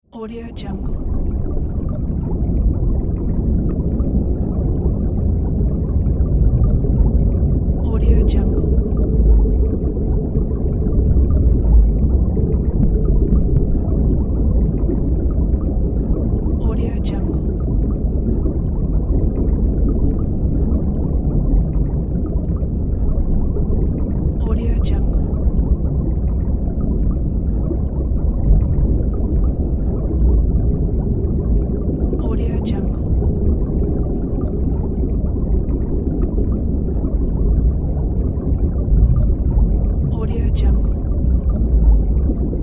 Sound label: underwater